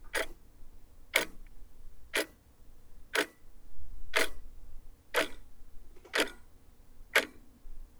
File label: ticking clock